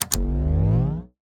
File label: Powerup